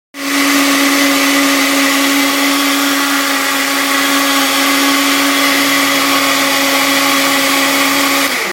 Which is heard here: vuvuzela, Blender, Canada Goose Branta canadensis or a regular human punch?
Blender